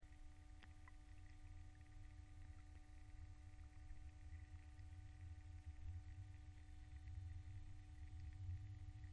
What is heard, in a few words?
underwater, Hydrophones